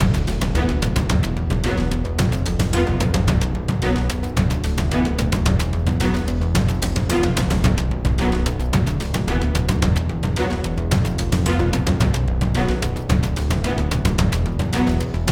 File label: cinematik; by burak sound bank; tension gerilim vol 49